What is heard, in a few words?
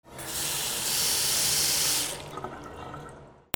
BATHROOM